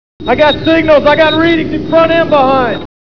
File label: signal